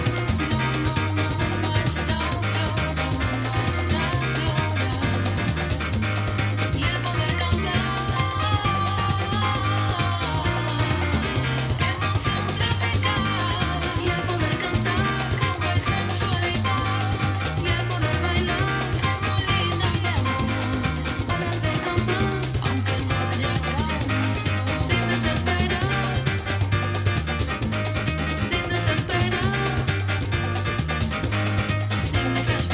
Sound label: samba